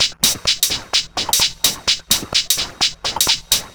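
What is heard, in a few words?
Percussion, Drum Loops 128bpm; Zero-G; Soundclash Nu Dance Electro; Acidized Wavs; Das Groove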